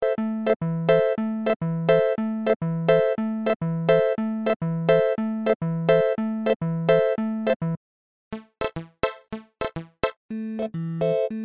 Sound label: pad; pa900